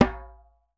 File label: African set percussion; Voice Crystal - Steve Reid Definitive Percussion